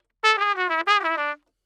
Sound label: Trumpet